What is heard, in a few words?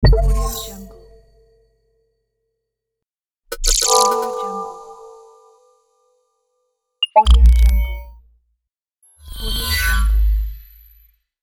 futuristic holographic interface pack